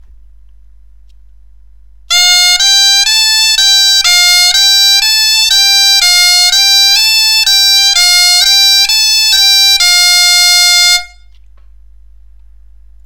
Gamme fa sol la naturel, bombarde

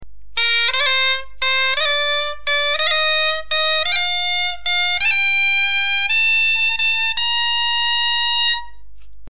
montйe arpege ornementation, bombarde

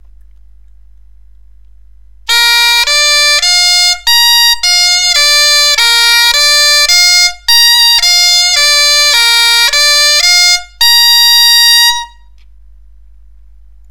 Arpиge entier si grave rй fa si aigu, bombarde; Si grave - Ré - Fa - Si aigu - Fa - Ré - Si grave

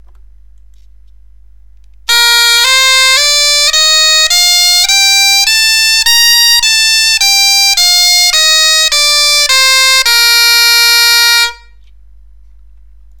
gamme complete, bombarde; Si/g Do Ré Mi Fa Sol La Si/a La Sol Fa Mi Ré Do Si/g

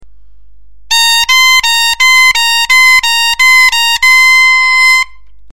si - do octave, bombarde; Partir du si aigu pour aller jusqu'au do ( plusieurs fois )

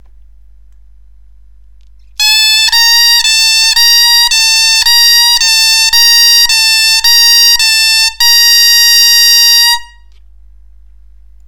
gamme la naturel si aigu, bombarde

